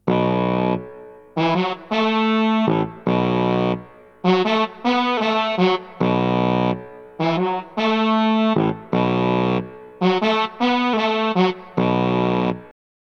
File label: Brass Ensemble